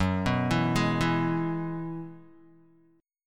F sharp 7th Suspended 2nd Suspended 4th F sharp down Guitar; arpeggiated; F#7sus2sus4; 4F,4A,5C,5G,5C,6E